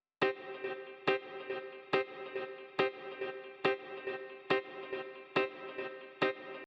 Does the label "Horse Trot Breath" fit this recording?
No